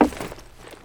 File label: wood, footsteps; Counter-Strike Global Offensive